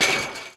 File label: fence climb; Quake 4 Alpha; id software; strogg; tik